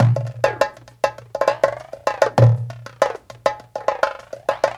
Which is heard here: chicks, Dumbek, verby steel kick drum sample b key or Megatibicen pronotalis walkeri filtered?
Dumbek